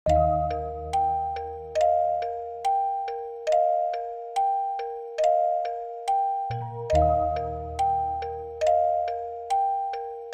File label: Orgel ver.; Beyond the Sky; Symphonic Rain